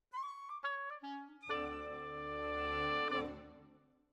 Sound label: Oboe